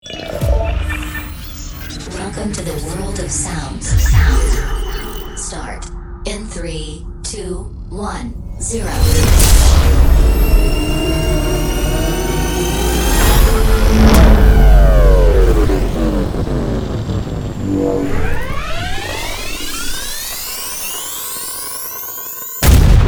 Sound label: hover sound design